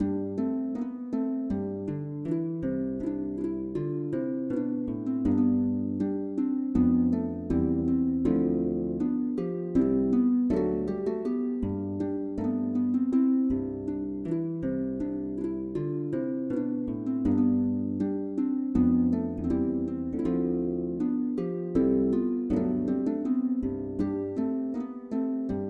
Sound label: Canaries Duet